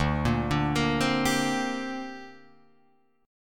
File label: D 7th Suspended 4th Sharp 5th down Guitar Drop D